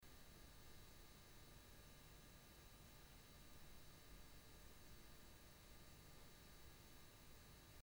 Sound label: silence room; TRV900